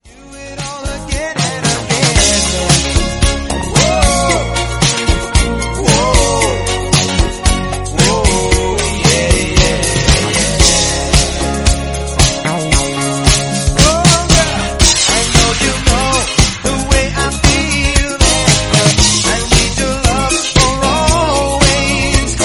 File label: Redrum 114bpm Bbm